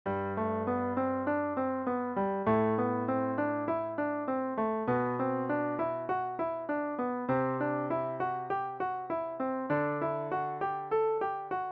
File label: diatonic scale chord